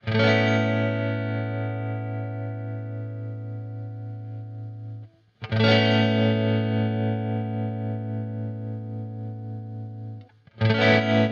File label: carl martin surf trem